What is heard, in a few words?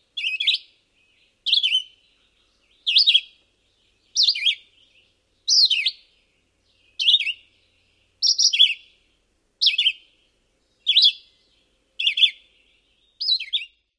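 song, Red-eyed Vireo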